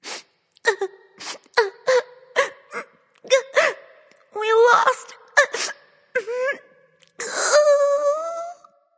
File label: boo hoo; smite